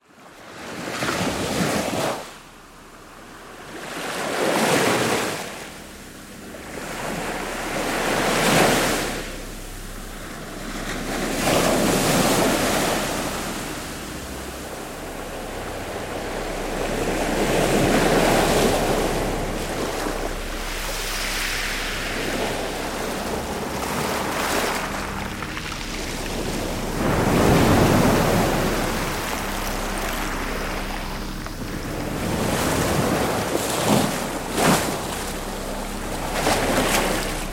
ocean waves; just sound effects

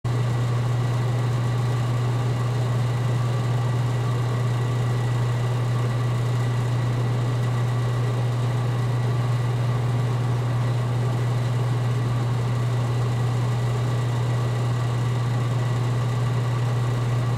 bars and restaurants sound fx; blastwavefx

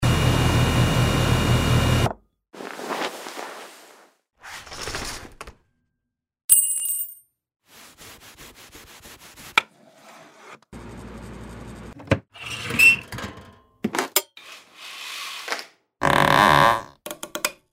living room sound

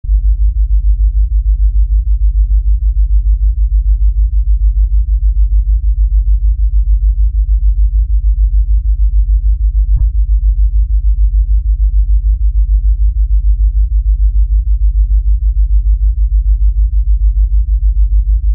low frequency vibrations sound fx; pse the producers library

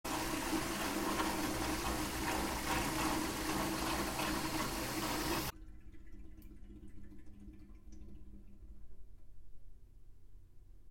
bathroom sound fx

